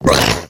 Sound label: female boomer pain; voice; Left 4 Dead 2